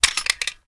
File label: reload xmag magout; gmod